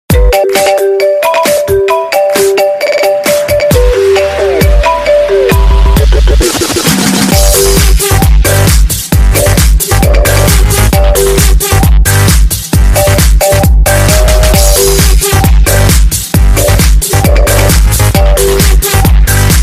iphone 5 remix cool ringtone

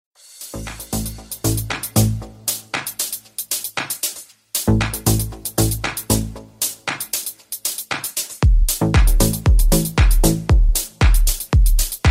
samsung s8 remix ringtone

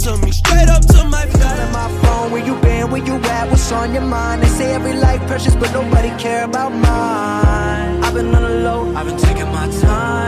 new hip hop ringtone

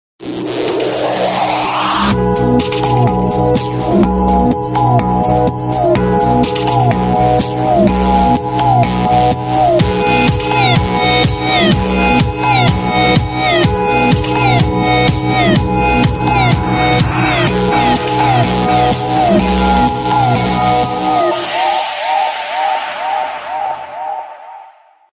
sony ericsson ringtone

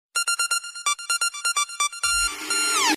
sms tone ringtone

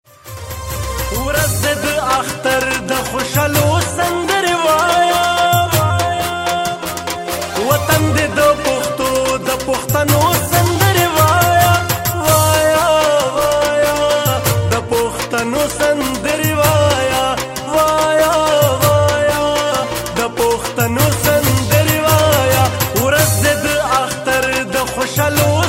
pashto ringtone

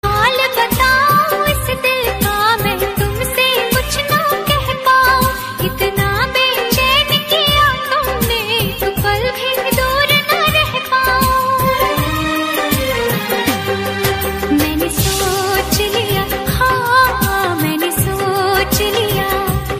sad ringtone

